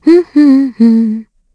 Vox Hum; Hilda; kingsraid

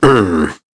Human Landing; en; Shakmeh; kingsraid

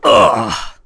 Damage, Vox; kingsraid; voices heroes en

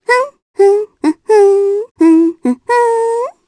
Hum, jp; Reina; kingsraid; voices heroes

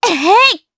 Attack, jp; Laudia; kingsraid

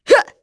Vox Attack; kingsraid; en

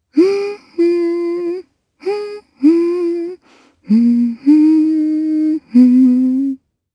Hum; heroes; Shamilla; kingsraid

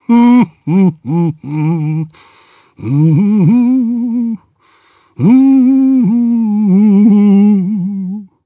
Hum, jp; Phillop; kingsraid; voices heroes

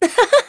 Vox Laugh; Aselica; kingsraid

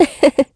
Laugh, kr; Ripine; Kings Raid

